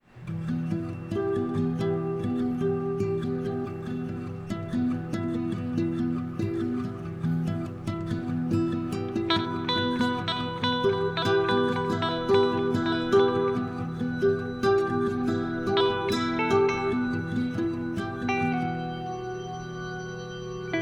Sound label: Cafe Latino